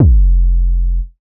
bass 808 puncture F; Polyend; ARC Noise

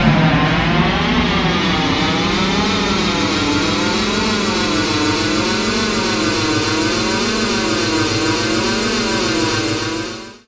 X MACH, MACHINES, Production Effects

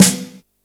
snare, Yamaha RY-8

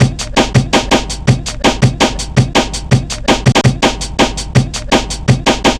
165 gabber, Drum Loops, Drum & Bass; Total Drum&Bass

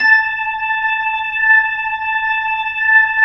B-3, Jazz

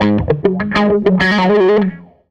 Tele Guitar Loop

